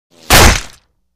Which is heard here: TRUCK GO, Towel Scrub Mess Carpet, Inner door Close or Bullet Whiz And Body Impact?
Bullet Whiz And Body Impact